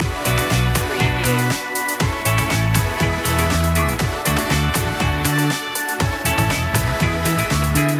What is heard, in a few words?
jump high loop